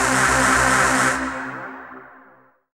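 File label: Rave Synth, Dance Mega Jungle Rave; Best Service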